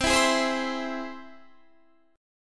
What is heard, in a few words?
C Augmented, Guitar Standard, chord; strummed; JGuitar